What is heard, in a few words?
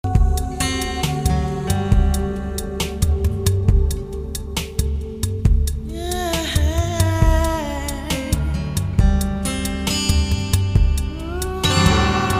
Ambient, guitar